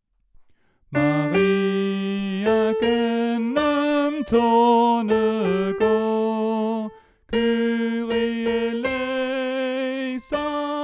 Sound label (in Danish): Sopran; oevefiler